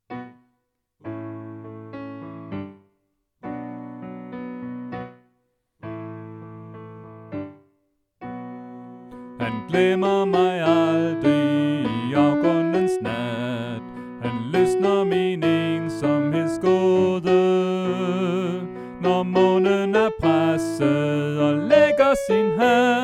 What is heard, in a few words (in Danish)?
Sopran